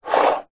household aftershave or perfume glass bottle slide on wooden dressing table; zapsplat